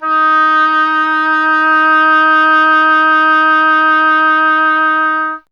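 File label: oboe, wind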